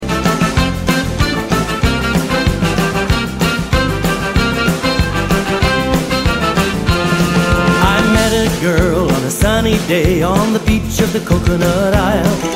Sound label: English